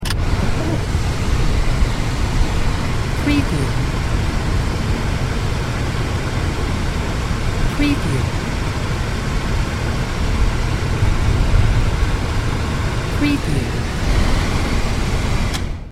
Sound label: Car Air Conditioner On Off